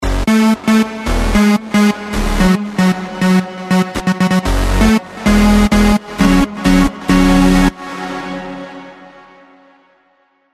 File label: BASS